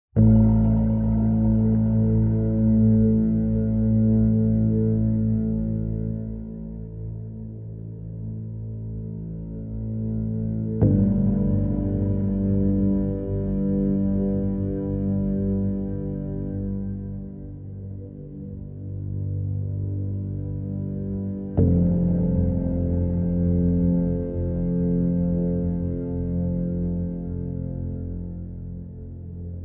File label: Bass Only